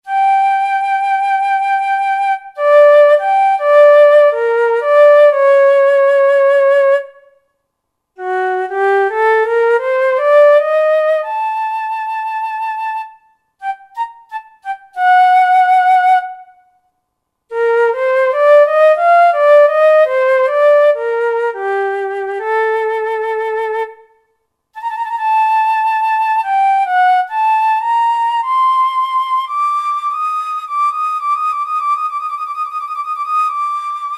Legato Flute; Roland SR-JV80-09 Session

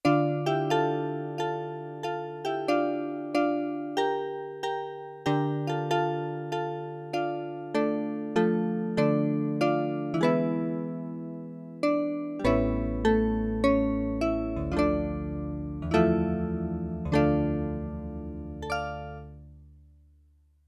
Gitarimba Octaves; Combo Performance 2

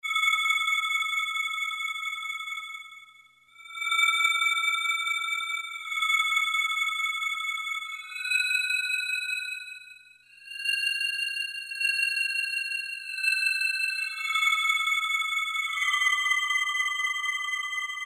Insect, Roland D-110; Factory Patches

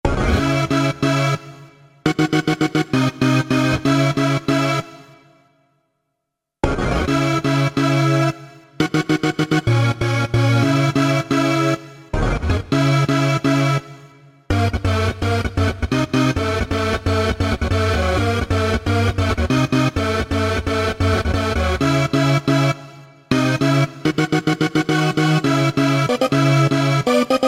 Lo-Fi Rave; Roland SC-880; Factory Patches

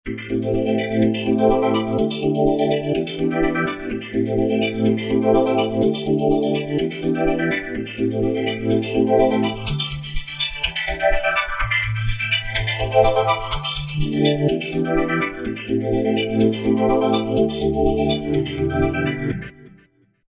Planet V, Roland V-Synth

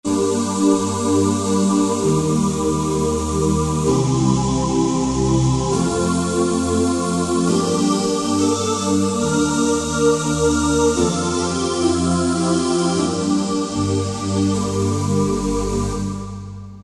Yamaha TG500, CH Ooh

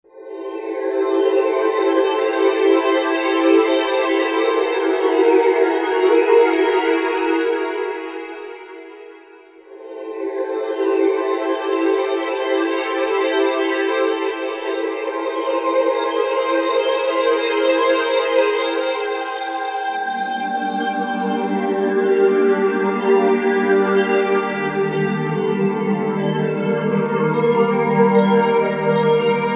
Monsoon, Korg M1Rex; Factory Patches

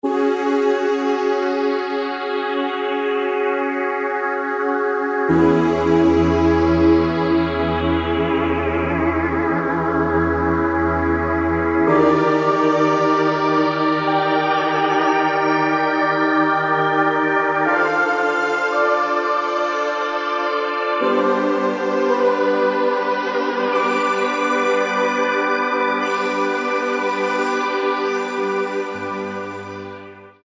Orchestra, Kurzweil K2000; effects; Kurzweil K2000 Value Pack; Xtra Programs; Jord Vox

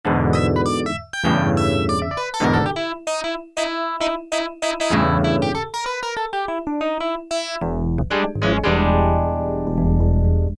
EPHONE, Casio VZ-10M; Internal factory combi

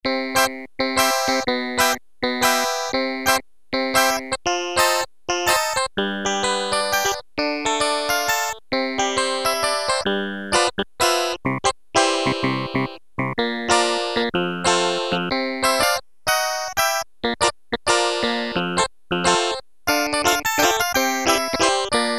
FUNKY CLAVI., Casio CZ-1000

